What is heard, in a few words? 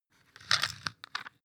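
floss pull out squeak, bathroom; haus-garten; badezimmer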